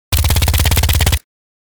Commando submachine gun short burst; gfxsounds

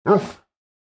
Dog golden retriever throaty breathy bark

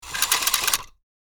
Throttle controller rope pulling fast

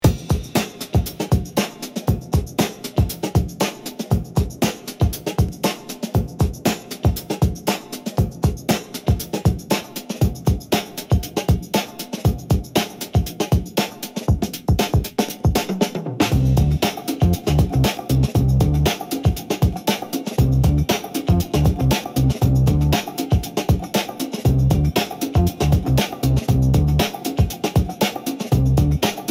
Urban beat

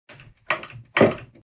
Hydraulic chair adjustment foot pump

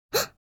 Surprise gasp girl child kid

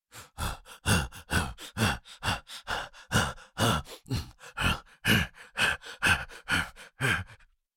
Cartoon lion voice breathing

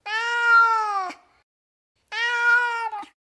Domestic cat meowing wanting attention

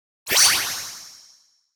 Sword draw shine

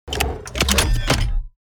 Sci-fi gadget gizmo cybernetic

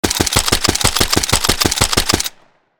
Heavy machine gun automatic fire short; guns

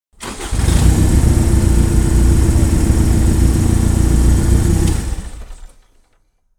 Forklift engine ignition and shutdown; construction vehicles

